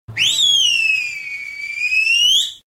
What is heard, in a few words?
Whistle Sms